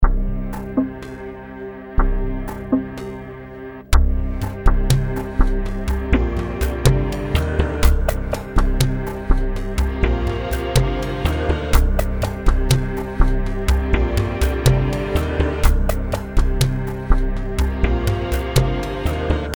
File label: start round, music; halo